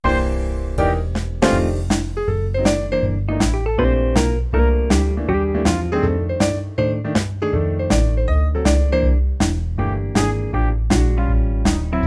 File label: Acoustic Jazz, rhythms; mzx500; preview pack 01